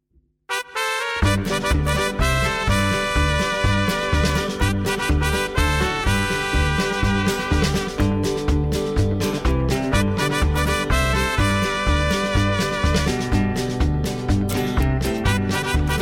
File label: Instrumental